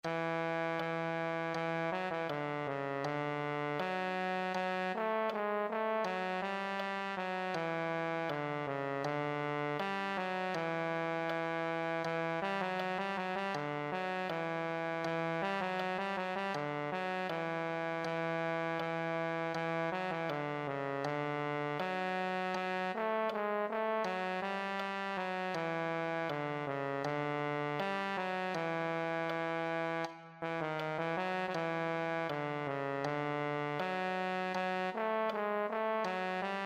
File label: euphonium